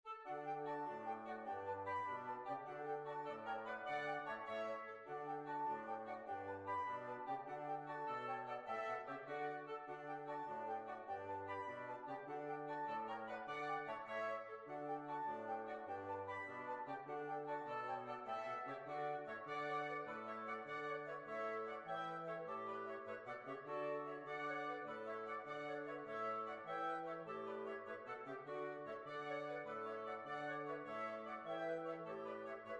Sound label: Jig; Sally Sloanes Jig